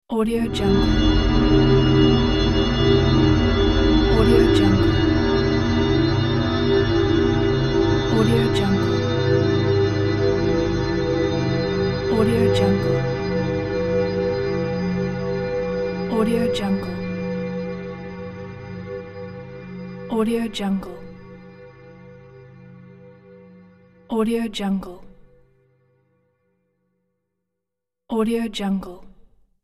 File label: shadow of the dark atmospheric